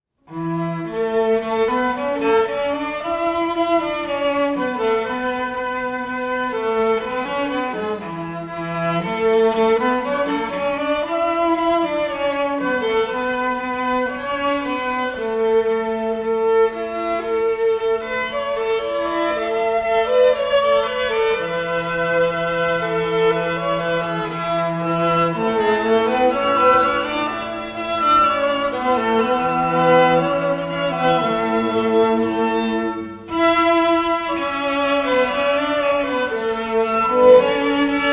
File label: shaker melody simple gifts, string ensembles; Copland; live recordings of Kentland String Ensembles